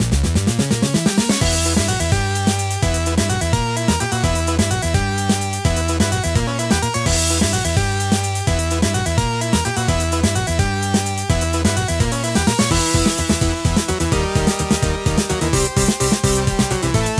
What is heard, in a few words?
WoodenShip Battle